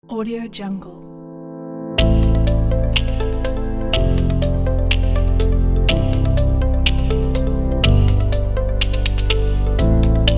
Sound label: science ambient technology